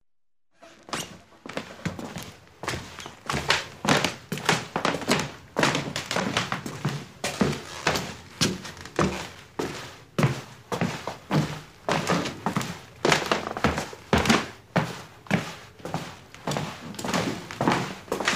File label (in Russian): zvuk shagov po derevyannomu polu skrip polovits